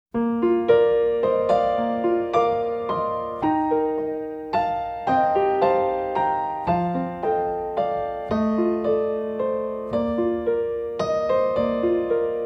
Velveteen Rabbit (Without Narration)

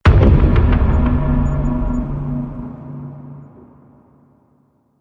Hit Deep; Ninja Tracks

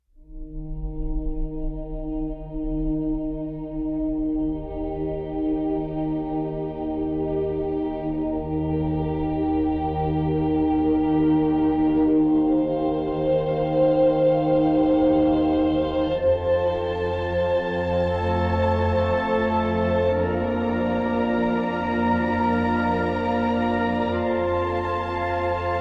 Ambient

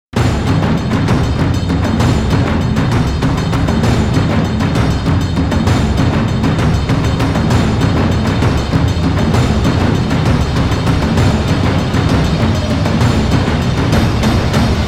Drums Only Version

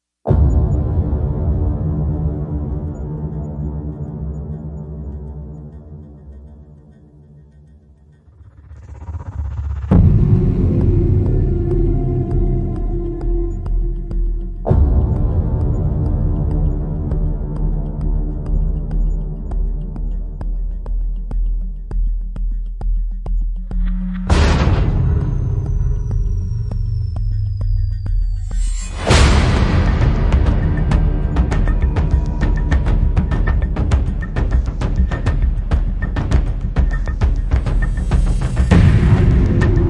No Synths; Ninja Tracks

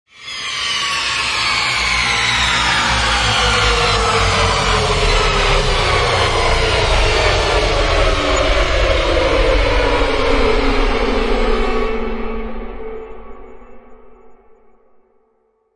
Orchestral Faller; Epic Tools vol. 1

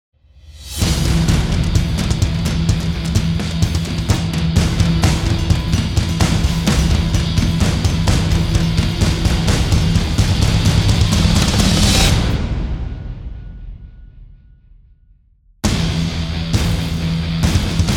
Rock Star Riser, Percussion; Gothic Storm Music

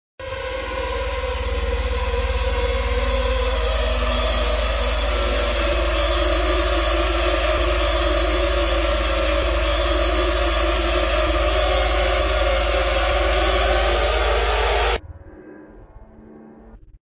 Laceration Texture Only; Ninja Tracks

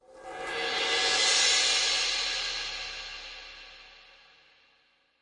Light Cymbal; Brand X Music; Brand X Music Catalogue - Editor's Essentials

